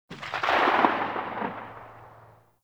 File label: Whip Crack Tail; Oraxia Whip